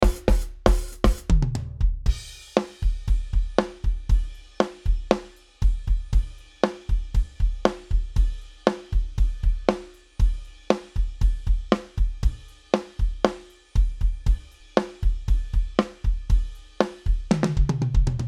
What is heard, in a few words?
drum